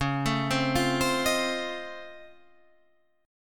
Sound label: C sharp Augmented Major 9th down, guitar chord